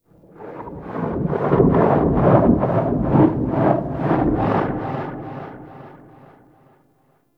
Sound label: Ext Rumble; Ambience; One Offs; Red Night